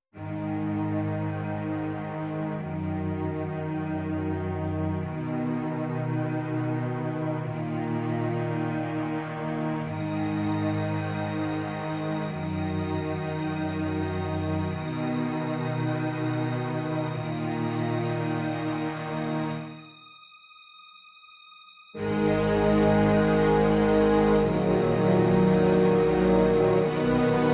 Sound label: Strings Mix